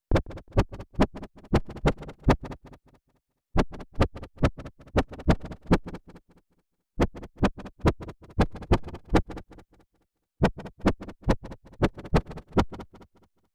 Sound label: 140 Bpm Attack Loop